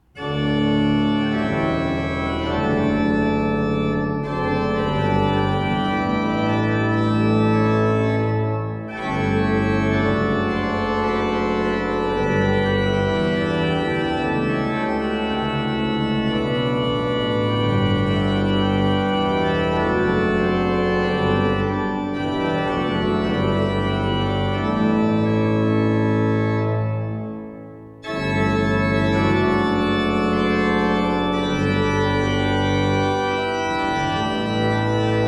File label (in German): Beckerath, gebrauchtorgel